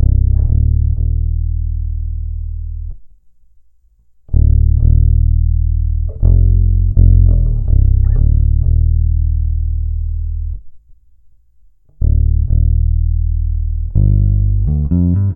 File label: Synth loop 125 Gm, Synth Bass Loops; Slate Digital BASSHOLE Sample Pack